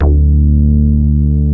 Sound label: D2 Moog bass